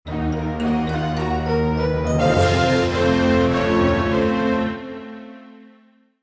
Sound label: Prisoner Saved Jingle